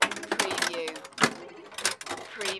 Pinball sound, long sequence of ball movement and clanks